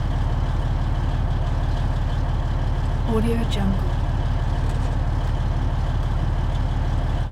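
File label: military tanks movement tank interior idle medium; game; تانک روشن متوقف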